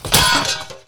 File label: Wickerflame Death